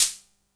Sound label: HH, Video-Tech Rythmic10